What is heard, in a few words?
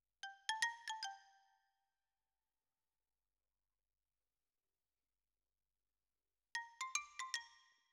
Xylo Close